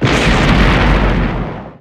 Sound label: Explo Medium; Destruction